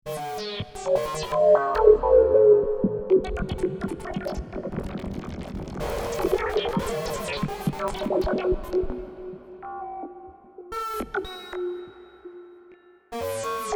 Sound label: Synthesizer Noise Jam